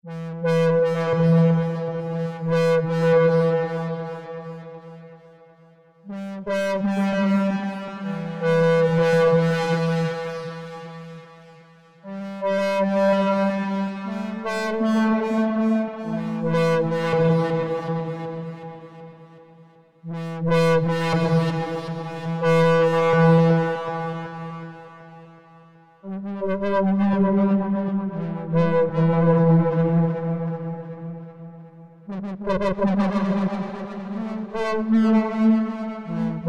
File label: analogue MIDI